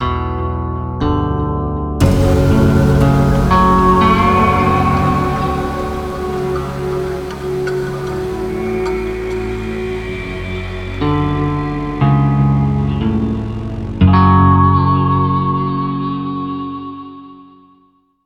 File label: round start; svencoop; ragemap3